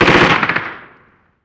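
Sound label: gun mp5 medium; Call of Duty Modern Warfare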